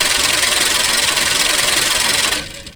fx one shot chains

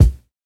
Kick

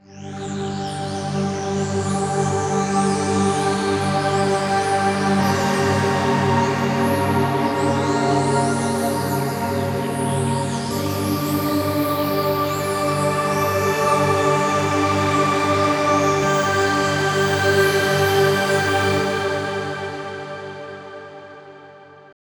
PAD DnB Crickets

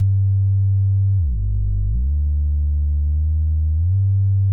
B Sub 128 Gmin

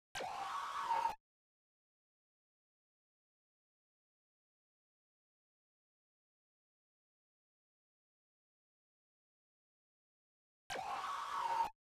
91BPM B minor FX

